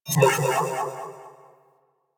Iron Shield Strip Attach Enemy; Warframe; Warframes

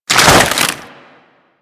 Infested Moa Flip Foley; Warframe

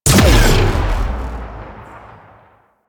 Weapon Three Shot; Modular Weapons

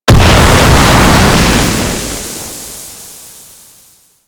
Flashbang A; Grineer Death Squad

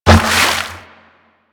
Aqua Board Land; Warframe; Yareli

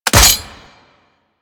Sawman Shot End; Attacks; Ghoul; Warframe; Grineer Ghouls

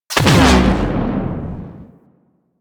Plasma Grenade Explosion; Warframe; Venus; Venus Grenades

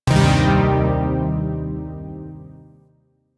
Shawzin Min Chord A; Chords; Warframe; Zariman

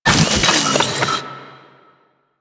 Drone Spawner Open; Warframe; Ambience; Entrati

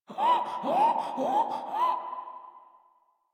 Infested Runner Breathe Quick; Warframe; Runners

